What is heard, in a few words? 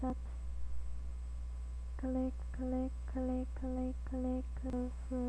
click